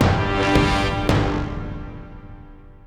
death stinger; SpongeBob Patty Pursuit

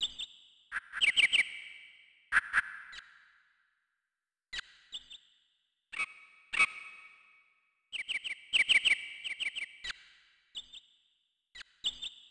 Ambience; Fisherman's Bait: A Bass Challenge; PS1

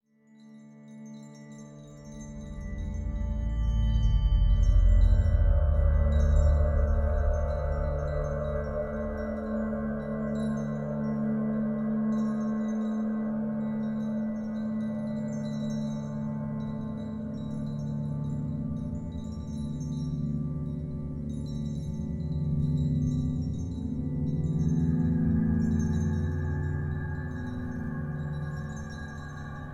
Night Drone; Standing Stones Intro

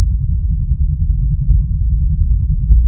Attack Dodge Puppet

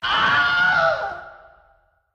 Wilhelm Scream Echo

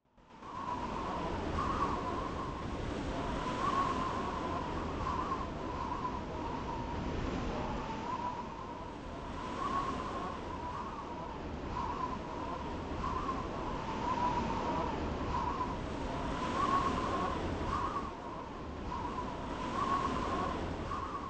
Ambient Blizzard; Star Fox Adventures

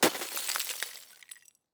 ice break; teardown ps5 windows xbox series xs gamerip 2022

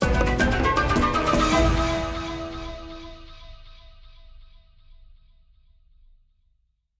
Music Ingame Bgm Lava End; New Joe & Mac Caveman Ninja

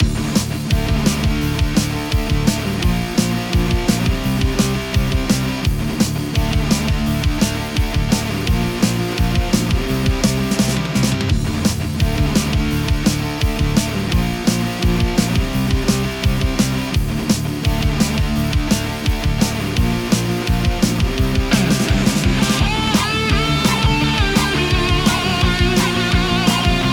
Metal Victory; Cryptheim

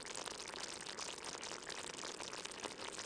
Fx Mushroom Boil; Levels Hub Swamp 04 Cook Mushrooms

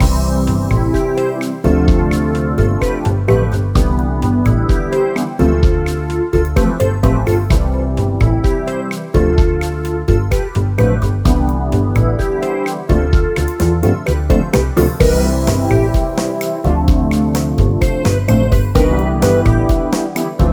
BGM Credit; wicked monsters blast hd; wii u

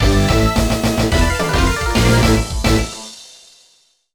gameover; Naruto Shippuden: Shinobi Rumble

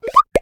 Caramel Miss Sound; Jolly Battle; Android

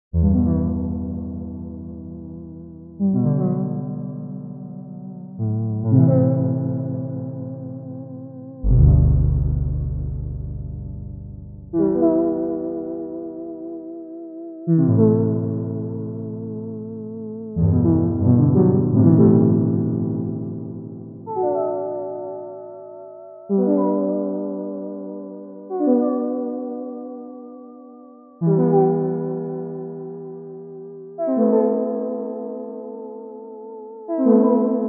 Piano goodness earthy alt short; Hylics

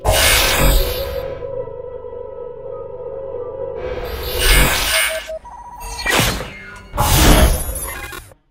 Startup; Friday Night Funkin' Pico vs Tankman

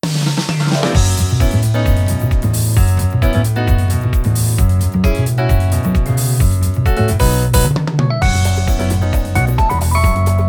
Music Menu event

